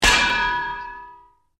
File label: anvil drop on head